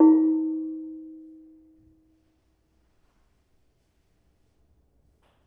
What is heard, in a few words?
Bonang Barung, gamelan; gravityharps; timbrebox